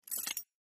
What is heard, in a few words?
keys jingle